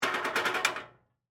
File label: Medical Scale, Emergency, Medical, Scale, Move, Weight; Hospitals